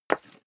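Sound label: step high heels wood parquet slide short, footsteps; schritte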